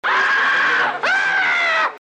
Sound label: scream